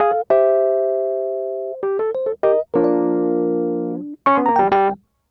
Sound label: Smooth Groove Rhodes, 70s Analog Funk; Sonic Foundry (Sony Creative Software); What it Is!